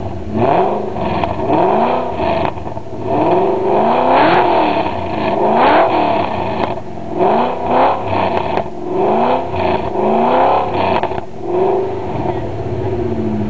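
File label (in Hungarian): ford gt, Kipuff hangok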